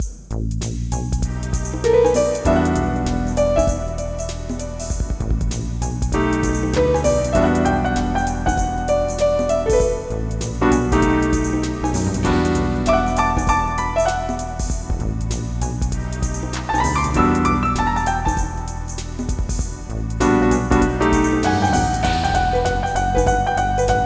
GM SWING

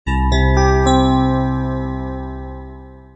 jingle; Win Ghost 3.00

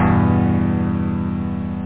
a minor piano; boombox demo

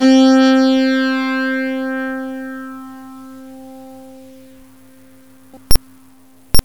Metal Pad, Synth pad; gmplay

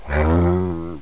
undead; mordor

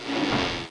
CRAK; Piposh Special

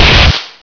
ion explode; PC Gamer IT CD 41; Daikatana

